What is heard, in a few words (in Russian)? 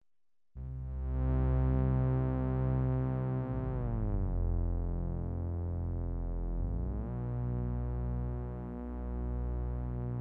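синтезатор низкий тон изменяющиеся басы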